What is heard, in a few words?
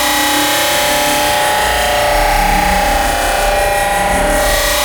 Laser Wall Loop; Enemies; Weapons; Warframe; Tau; Sentient Dactolyst